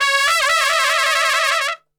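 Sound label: C#2 trumpet shake, Brass; Best Service; Brass Super Section; TRP SHAKE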